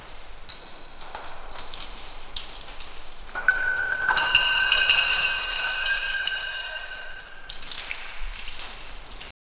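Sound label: underground dungeon ambience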